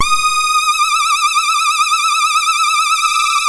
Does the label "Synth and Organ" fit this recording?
Yes